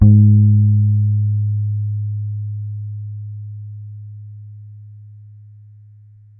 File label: Bass one shot High Ab, Electric Bass; Slate Digital BASSHOLE Sample Pack